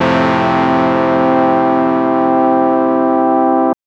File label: Synth FM 2 Op Basic 3 maj c2